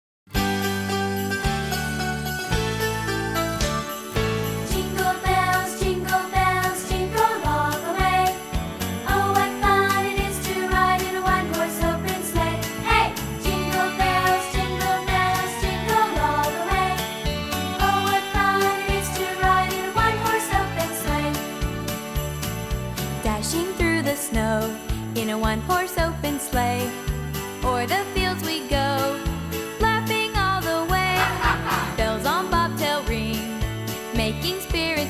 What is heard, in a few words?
jingle bells